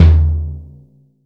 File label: KICK, JAZZ KICK